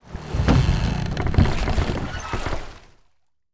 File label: Docks, complete; 0AD